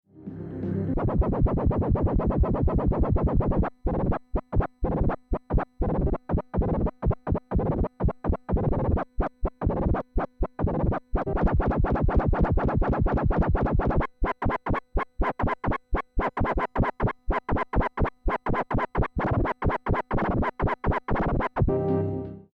Pioneer DDJ SB3 Scratch

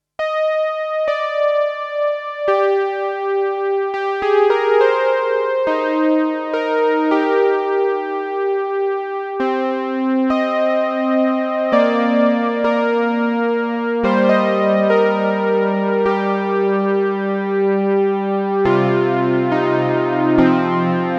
Boss CE-300 Juno Chorus I

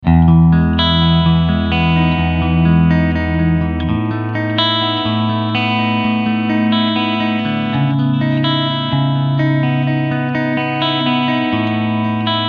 Clean Chorus SM57

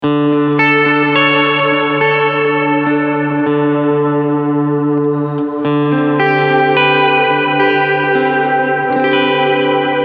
Rev Delay

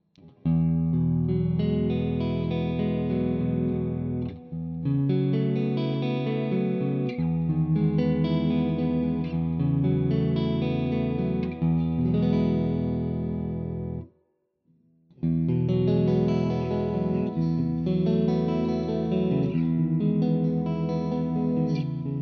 GTRS Preset Clean Tone all positions

